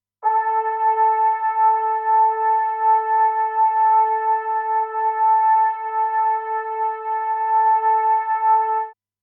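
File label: Trumpet Flute; プロの音プロの技・令和版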